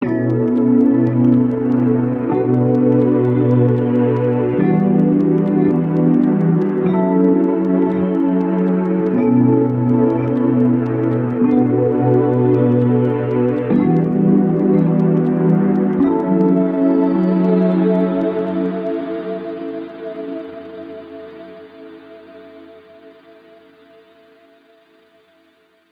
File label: amaj9 bm7 em9 fm7 105bpm